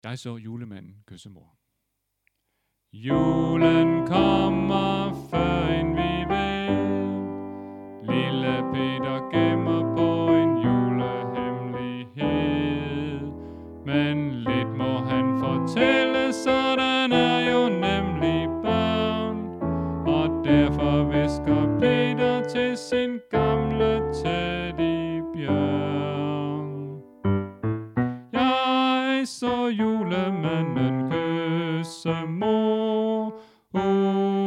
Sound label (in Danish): Bas; Stephans-koret; oevefiler